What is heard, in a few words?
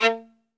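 SOLO VIOLIN, Synclavier Strings; ILIO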